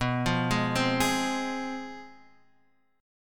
C Major 7th Suspended 4th Sharp 5th B, chord

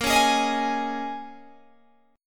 Bb Minor 11th Bb down Guitar Open G

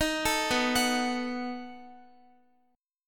B 7th B down Guitar; arpeggiated

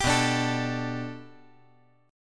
B Minor 7th Sharp 5th B, chord

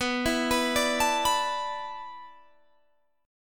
D 6th Suspended 2nd B, chord; D6sus2/B arpeggiated

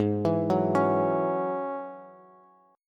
Ab 7th Suspended 4th Sharp 5th, Bass; Ab7sus4#5; arpeggiated; chordshape; 16,19,16,18; JGuitar

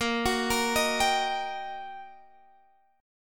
G Minor Major 7th Sharp 5th, Bb

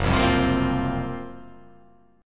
F sharp Major 7th Flat 5th

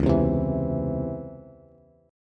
C sharp Minor Major 7th C sharp Bass; C#mM7 strummed; JGuitar

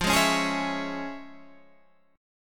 F Minor Major 7th Sharp 5th Guitar Standard; strummed; JGuitar

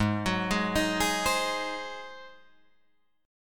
G sharp 7th G sharp, Guitar Drop D

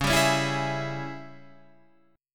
Ab Major 7th Suspended 4th Sharp 5th Db, Guitar

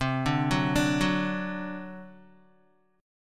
C Suspended 2nd Flat 5th C down Guitar; Csus2b5 arpeggiated

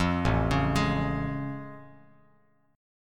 A Augmented Major 7th A Bass Standard; arpeggiated; JGuitar

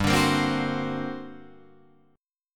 G Minor Major 11th, G, Guitar, Drop D

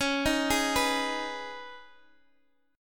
Db 7th Suspended 2nd Db, down, Guitar Open D

